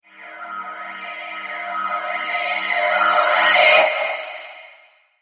Reverse Cymbal Whoosh

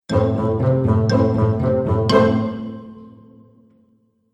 Cartoon Fun Musical Element

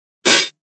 Clank Hit, Metallic

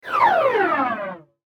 Transition Flange Descend Slow; Multimedia; Blastwave

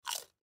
Human Bite Food; Bite Food Chip Crunch; Eating; Sound Effects:Human

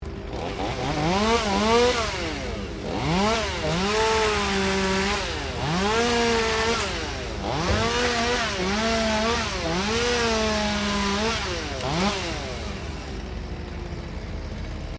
Chainsaw Operating, Standard chainsaw operating; Machines; Saws

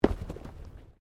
Body movement Impact Roll

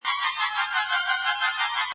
Space Scifi Sound Alarming, Sci-fi Klaxon, Alarm; Evacuation signal

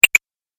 8 Bit Style Switch; Video Game Sound Effects; 8-Bit Style Switch 03

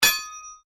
Strike Metal On Metal Heavy Ring

